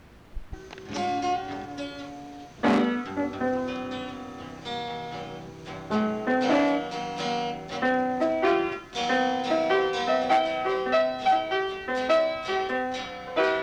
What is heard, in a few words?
Piano